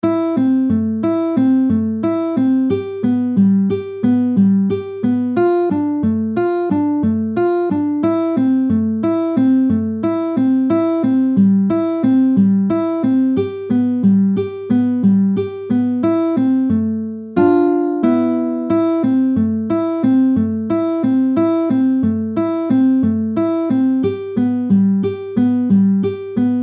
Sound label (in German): nur Gitarre; pfadfinden